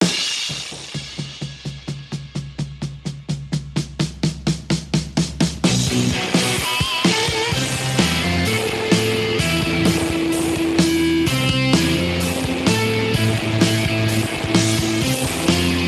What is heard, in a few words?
generic rock; speex 64vbr wide 10cmplx